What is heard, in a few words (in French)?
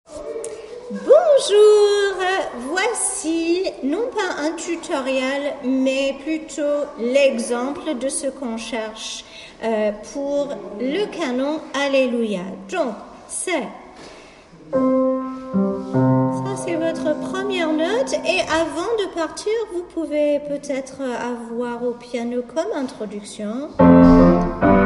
boyce alleluia, chorale